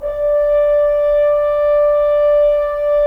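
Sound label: Solo French Horn; Roland - LA Composer vol. 4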